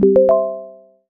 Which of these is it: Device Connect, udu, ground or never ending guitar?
Device Connect